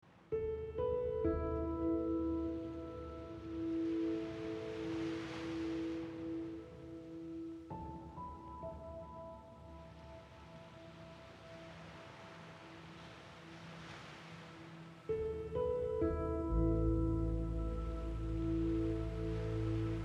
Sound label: Sea Melodies